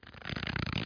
card shf; Infobase

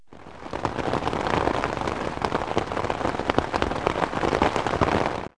Heavy Rain on Umbrella; Outdoors & Nature; The Elements; 1,000 Sounds (16-bit)

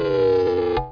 LAUNCH; Pong

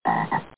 croak

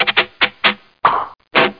wind down cartoon; Widget Workshop; Maxis

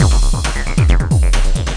Bublz; Cloudz 1.0.0

